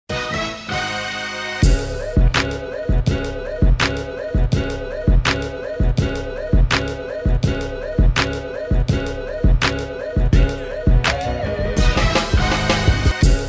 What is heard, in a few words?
instrumental; Covid19 Respirator Mask 3.1 Imperial Blue Limited Edition